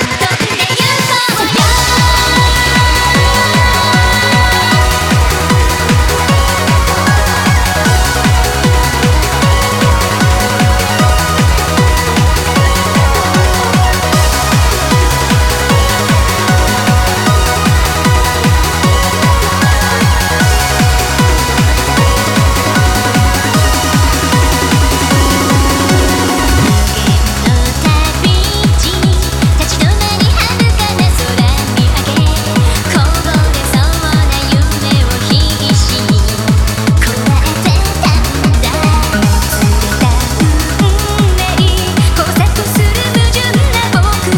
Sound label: Trance Remix; Super Shot5 Special Edition; Bishoujo Game Remix Collection; Shukufuku no Campanella